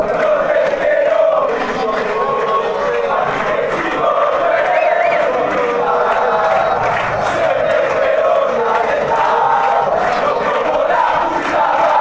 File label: chant, fanchants; millonarios; mfc